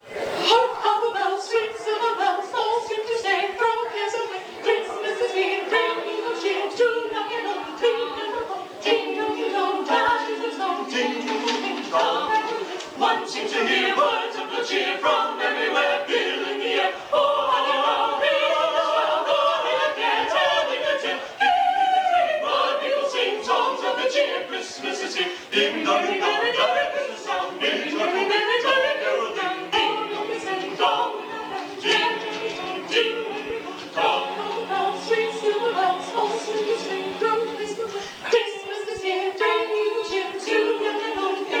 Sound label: song dingdong